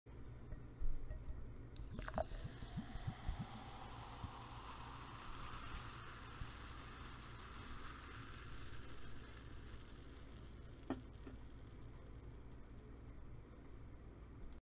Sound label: Pouring soda